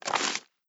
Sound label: page flip